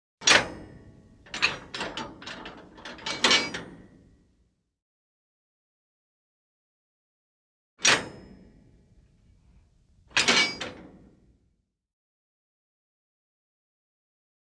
Zakryvajut tjuremnye reshetki

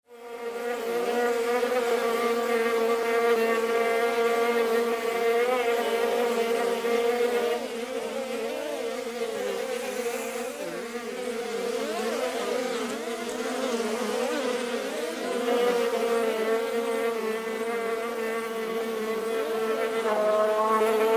Ulei roi pchel

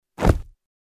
Sprygnul

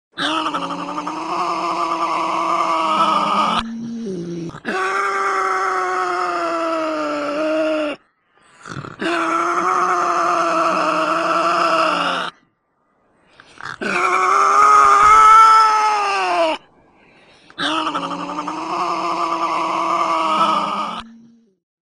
Tasmaniiskii dyavol

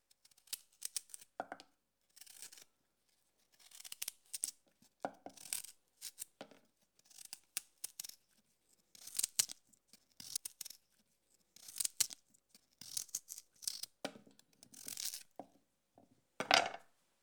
Kartoshku chistyat

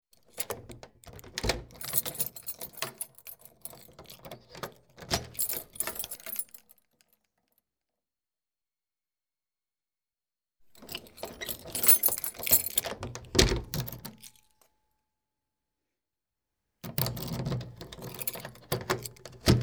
Zamok kljuchi

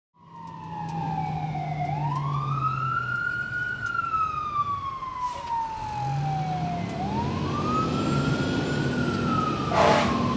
Pozharnye mashiny edut

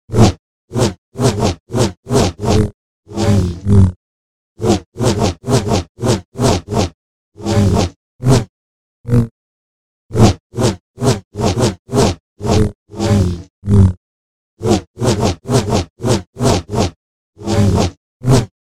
Svetovye mechi

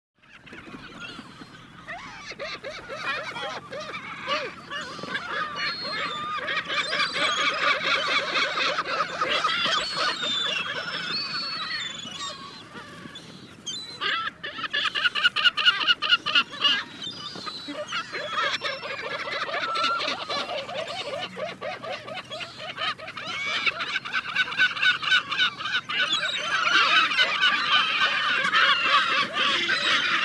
Staya chaek

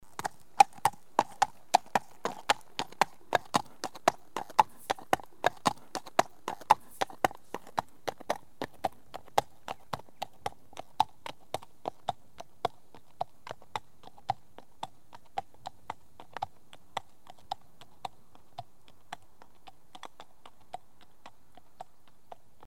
Loshadinyi topot

